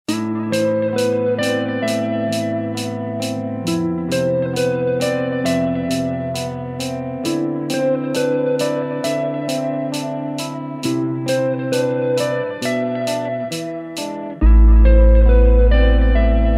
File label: Beat Tape